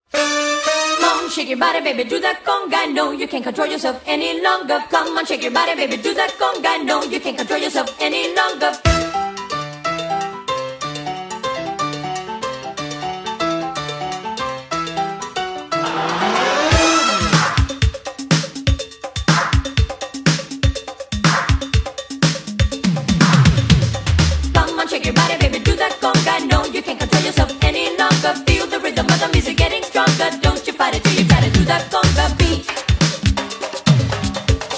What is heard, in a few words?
Conga; wow-weakauras